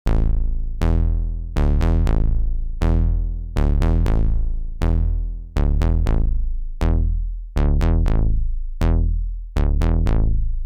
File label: Arturia Polybrute FilterBass between serial and parallel Routing